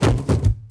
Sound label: bodyfall metal